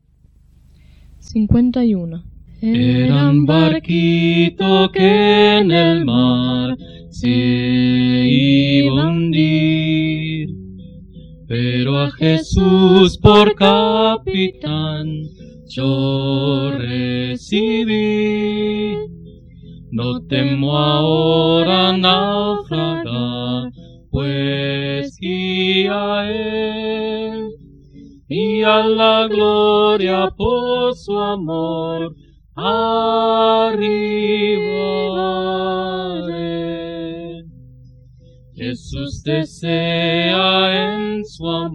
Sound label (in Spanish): Coro, himnario niños